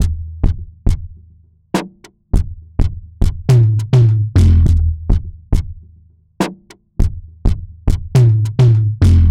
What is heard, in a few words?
103 filter tom